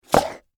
High Heels Scrape, Footsteps, High, Heels, Scrape, Wood, Surface